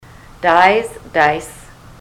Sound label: dies dice; ontario